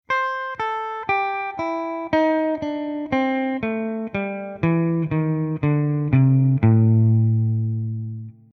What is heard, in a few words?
blues scale a